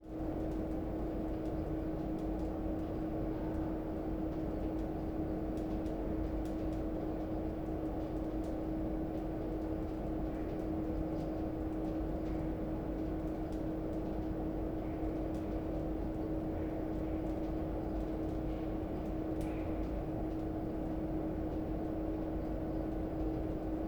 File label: Industrial Gas Boiler room pipes instalations room in front near; Ivo Vicic